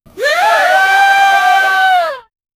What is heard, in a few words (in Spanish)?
efecto de bloqueo, party